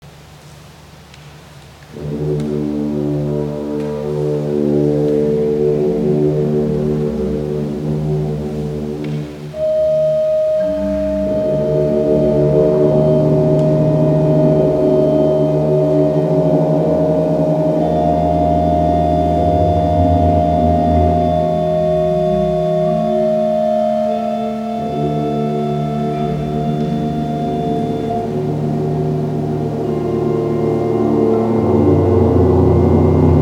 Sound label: improvisation; konzert; nurnberg